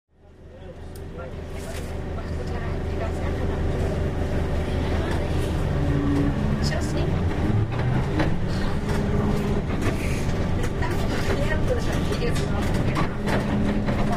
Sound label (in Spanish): transportes trolebus; linea k